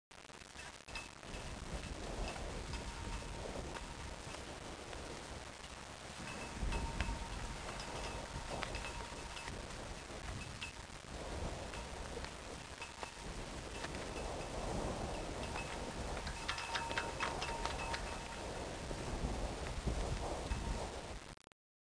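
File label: flag pole, parks; ambient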